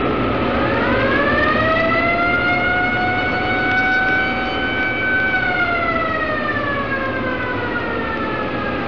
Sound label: nautical